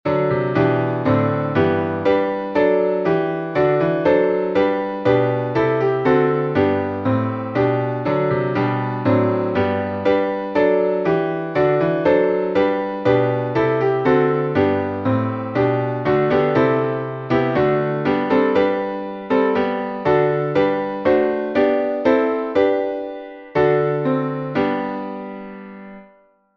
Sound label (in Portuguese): salmo playback